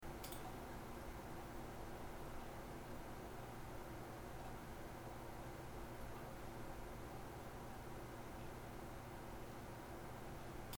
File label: blank recording